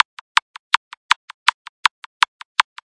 162 klip klap, drum loop; 161 to 180 bpm

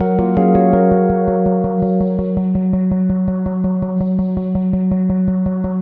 memory repeats epiano; REMIXABLE COLLECTIONS

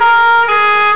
lofi two tone ambulance; Sound FX Real Life

